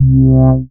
Bass Shot C

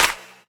Claps & Snares

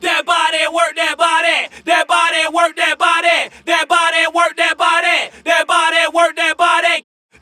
VOX

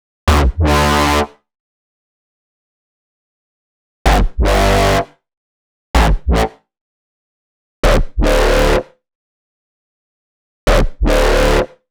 128 Dm Bass, Complex Electro Synths; Prime Loops; Deus

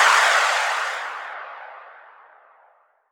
FX Downlifter

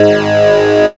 HARD Ab2; dubstep; Jack Sparrow; Deep Dubstep Progressions

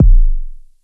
Kick

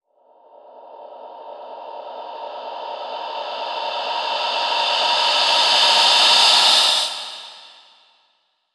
FX Uplifter

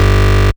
Bass Shot G

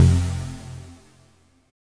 NOISY C2; Jack Sparrow Deep Dubstep Progressions

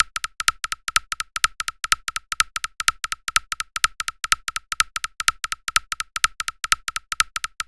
125 BPM, Digital Breaks; Loopmasters; Jon Carter & Alex Blanco; Block Party Essentials

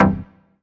Synth Shot C; Mutekki Media; Vengeance Electroshock Vol.2

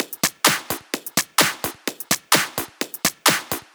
Loop, 128 BPM

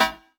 Synth Shot A; Mutekki Media; Vengeance Electroshock Vol.2

